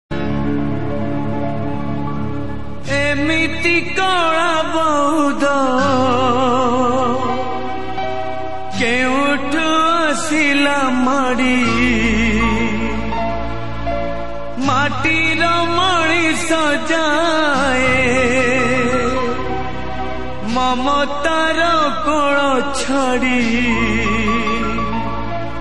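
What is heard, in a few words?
sad, Odia Song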